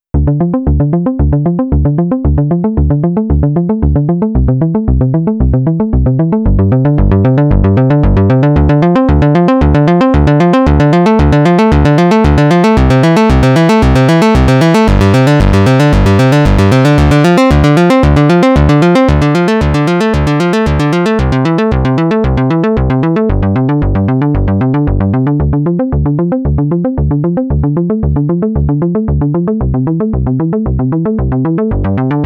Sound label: Behringer System 100 Dual VCO Bass